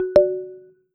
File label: Antic; iOS 17 Tones; Text Tones